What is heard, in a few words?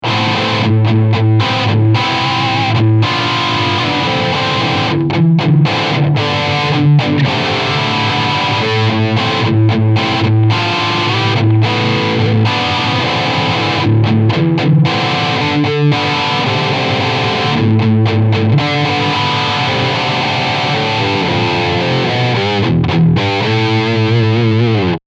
HB Medium Gain